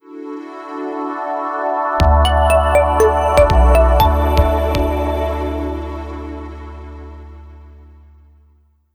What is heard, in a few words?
Clean Logo